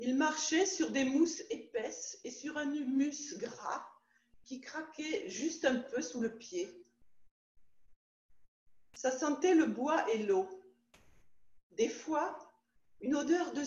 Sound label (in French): chant